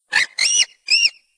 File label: squeak; Animal Sounds; Various Animals; Short calls